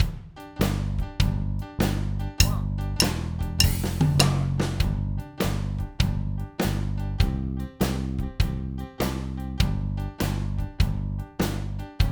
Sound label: jukebox alternate solo